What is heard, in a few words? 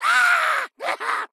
Hurt